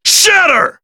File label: Axe Kick; BBTAG